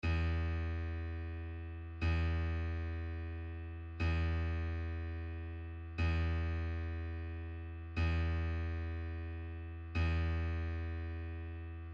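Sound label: e string, guitar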